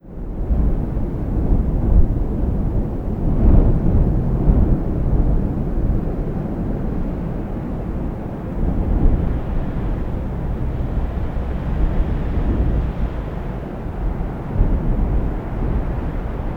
Blackrock Wind

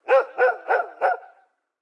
Ambience Menu Dog Barking; Subway Surfers City; Android, iOS

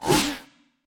Dash; Caffiend

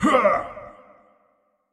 Effort Short; Marv

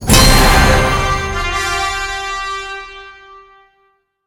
UI LevelUp

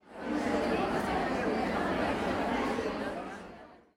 Crowd

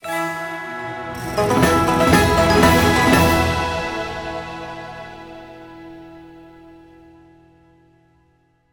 Chest Opened Jackpot; LEGO Horizon Adventures

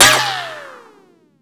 Troll Shield Take Hit; Troll SFX Shield TakeHit 01

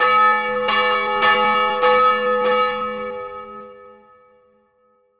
Gear Ring Bell; gamerip; disney star smash; android; Enemy

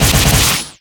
Double slash Hit

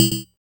save full

